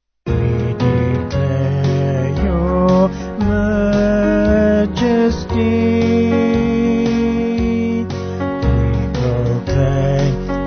Vocal Band

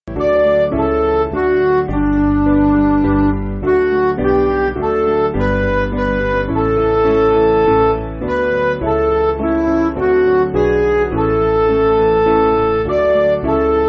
Midi

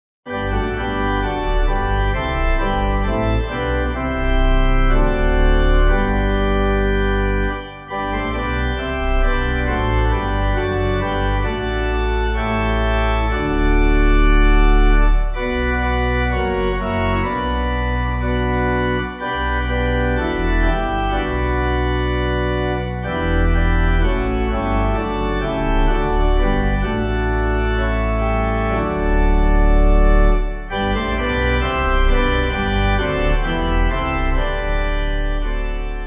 Pipe

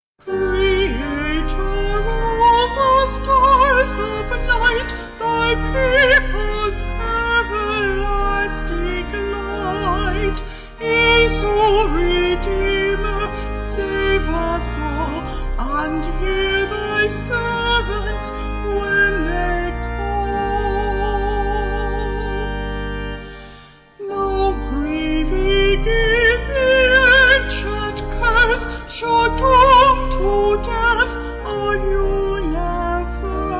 Vocals Organ

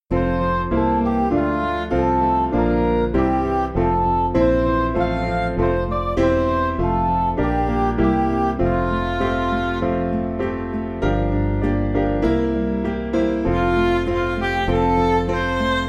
O To Fathom, Midi

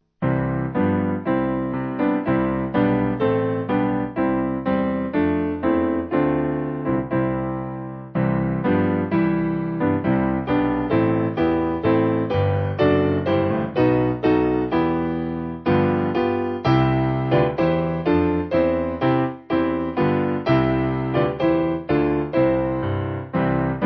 Piano; Simple Piano; All who worship God in Jesus; Vision

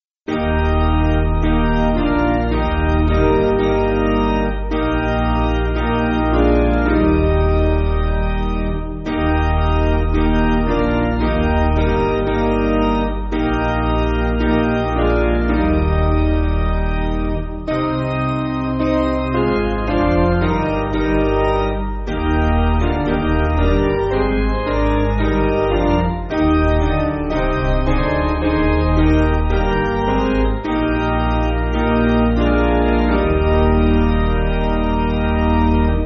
Piano

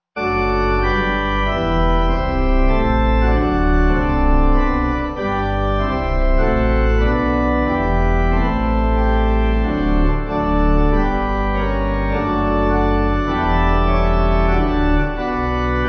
Pipe

